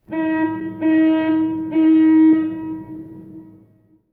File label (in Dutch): stoomhoorn